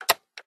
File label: click